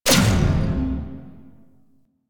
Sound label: Electricity Hazard Pulse; Warframe; Entrati; Vaults